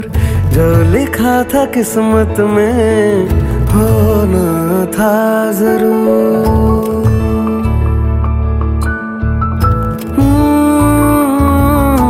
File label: hindi ringtone, bollywood